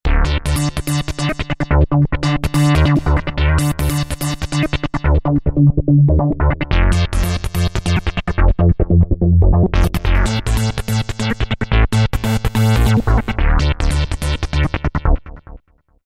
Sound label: First Bass, Roland V-Synth; Factory Patches